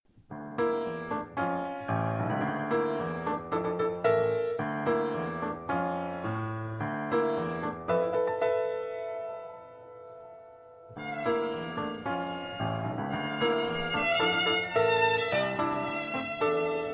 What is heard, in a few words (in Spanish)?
piano y cuerda; legato; desde el principio